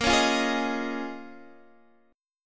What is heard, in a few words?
Bb Minor 11th, Guitar, Open D; x,13,11,11,11,13